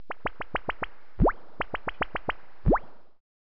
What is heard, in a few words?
space rabbit